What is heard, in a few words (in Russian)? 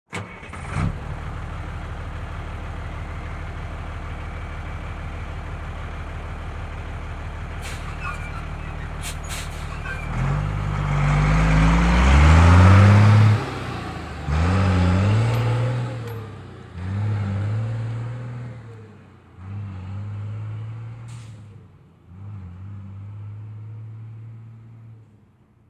musorovoz gruzovik zavoditsya uezzhaet